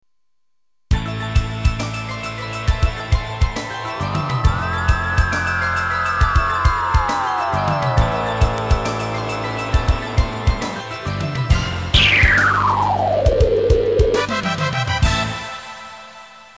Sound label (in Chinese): 电视包装音乐